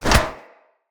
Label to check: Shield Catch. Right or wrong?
Right